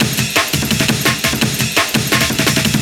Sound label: amen early